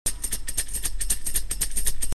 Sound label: think tambourine